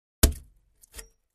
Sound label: Axe Paneling 1 Side, axe hits